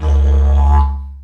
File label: Didgeridoo Kit Samples